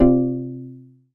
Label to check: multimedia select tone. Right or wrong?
Right